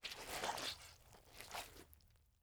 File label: Liquid Water Towel Stepping On Wet Towel